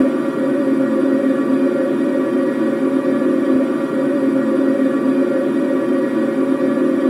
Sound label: Texture Loop, Techno; Ghosthack; AC2024 - Day 1 - Techno Sounds 2025